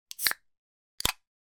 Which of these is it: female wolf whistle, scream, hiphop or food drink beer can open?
food drink beer can open